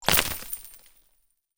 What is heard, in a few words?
player break rock